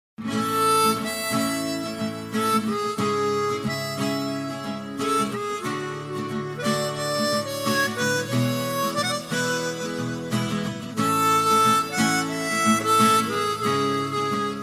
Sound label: Very Nice Tone